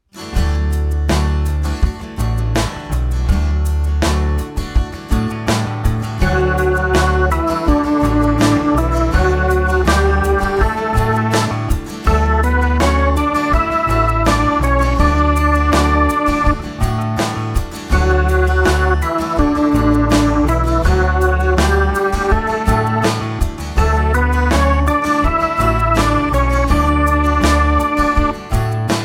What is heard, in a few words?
melodic dictation